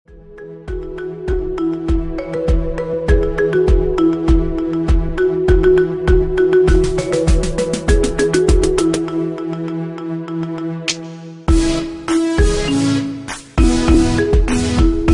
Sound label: marimba tone